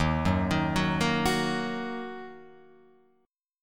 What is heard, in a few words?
Eb Minor Eb down Guitar Open F; Ebm arpeggiated; JGuitar